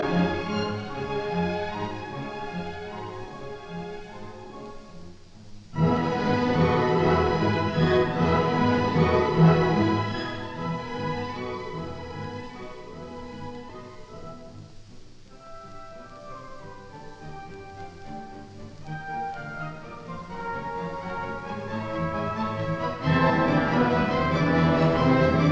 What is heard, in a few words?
Symphony in B flat major Scherzo Trio Molto vivace; No 5; WAB 105